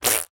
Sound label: cartoon squish squelch wet; zapsplat